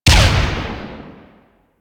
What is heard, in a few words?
Flame Spear Explosion; Grineer